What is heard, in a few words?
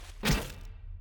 take damage; HLD